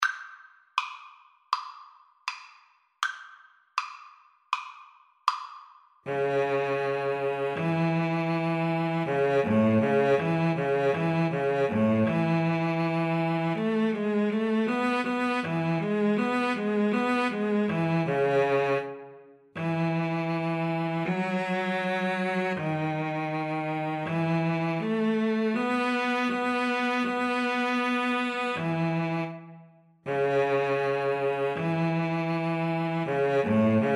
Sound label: Viola Cello Duet